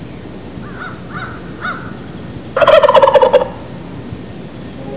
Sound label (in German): truthahn; geraeusche tiere